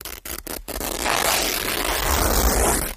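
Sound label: Cloth Rip Short Tears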